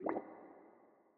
zone gas blop

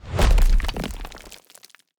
Unstable Bat Rock Item Hit; TANK; 3Souls

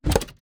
ui corridor construction plan place

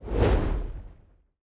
arena spell draw start

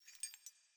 ambience room chain base

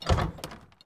object chest open

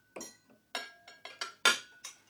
ambience room butchery working layer

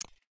ui click cancel

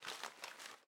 ambience room shadow room working layer

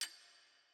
ambience room metal base

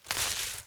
environment zone thorny bush step

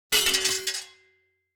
sword break; player

